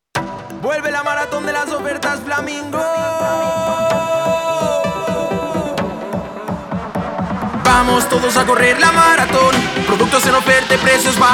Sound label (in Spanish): Jingle; Flamingo; Maraton